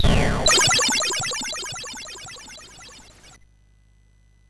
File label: sid MOS 6581R2